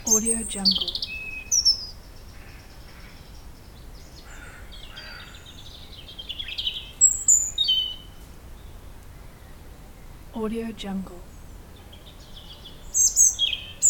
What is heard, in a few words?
ambience day wildlife